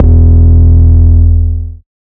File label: 808 Bass Attack E3; Slate 808 Deep Dive; SD Signature 808s